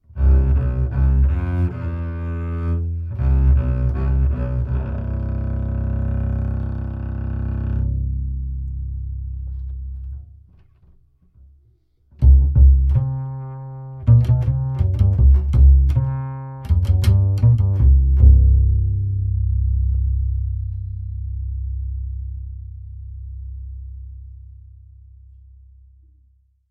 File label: upright bass, flat; lewitt; dtp340 rex